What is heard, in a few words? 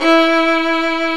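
ARCO violin, Solo String; E-MU Producer Series Vol. 2; More Studio Essentials; Composer; Solo String Combo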